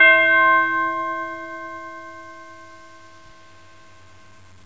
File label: Tubular Bell, Chromatic Percussion; GMPlay